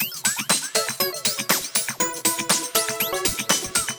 elec; Google; NewWave Labs

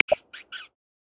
Ermine, Polar Region; Xiaomi Redmi-Note-8-Pro

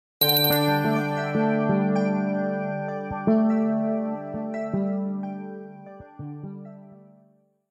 Calendar alert; Nokia X2-00

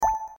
menu; KU990i Viewty

